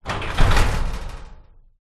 household garage door metal attempt to lift but locked